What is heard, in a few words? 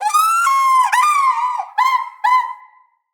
Bird Player Lure Call; Venus Animal Lure; Sawgaw